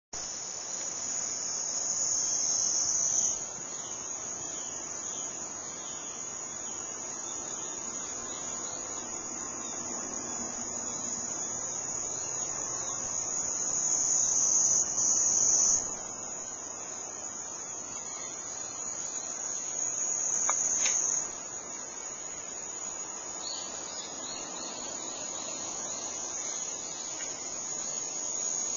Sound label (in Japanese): niiniizemi; ikimono; doubutu